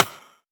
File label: Percussive